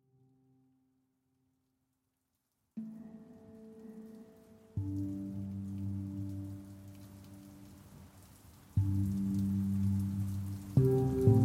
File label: pan drum with rain; deep energy